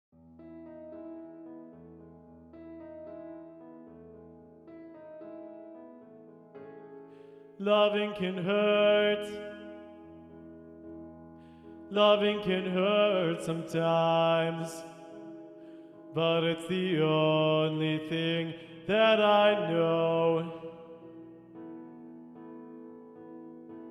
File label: Bass; Practice Room